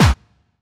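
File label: snare drum bass drum, Dance Mega Drums; Best Service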